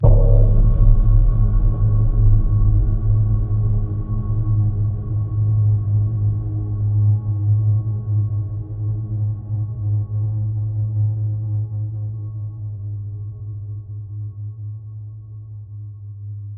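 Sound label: reverberator sound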